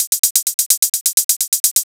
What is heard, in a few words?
Hihat Loop 128 BPM